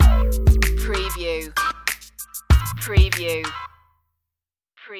beats stinger, chill

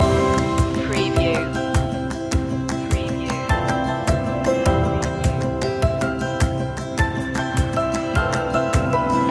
shining through loop

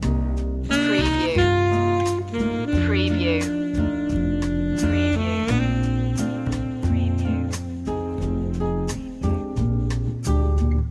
viva fiesta loop

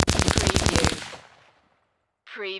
Machine Gun Colt AR-15 Long Burst Close Range

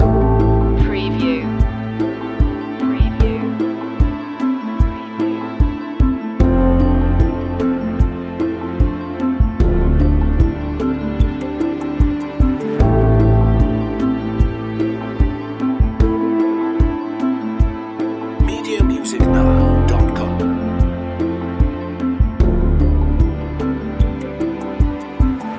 pieces falling into place loop

